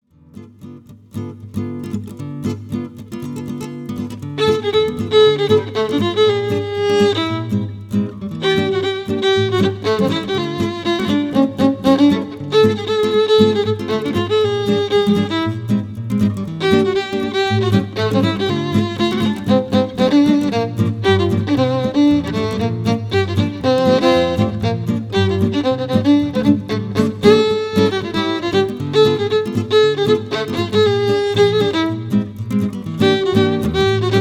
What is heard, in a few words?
guitar violin